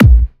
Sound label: Kick, 140BPM; Genetic Kick